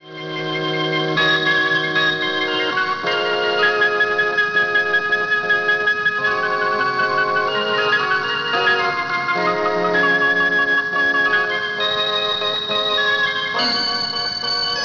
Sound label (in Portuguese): orgao